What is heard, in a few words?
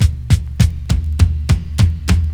DRMROLL; E-Lab; X Static Goldmine 2; akai